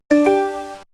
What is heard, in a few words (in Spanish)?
nuevo email; msn